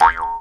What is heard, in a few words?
E JAW, Primitive Instruments